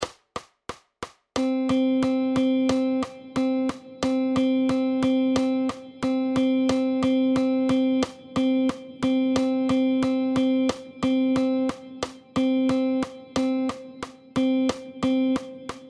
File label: rhythm